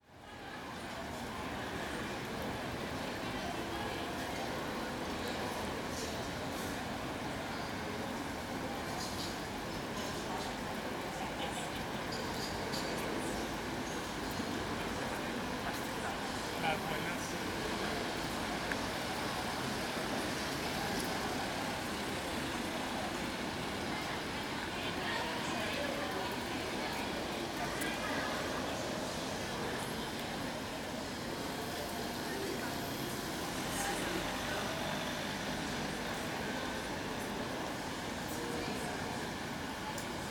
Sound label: People At Plaza Near Coffeshop